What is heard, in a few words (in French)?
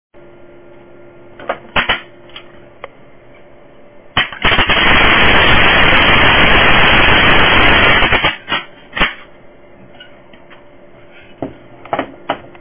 crecelle son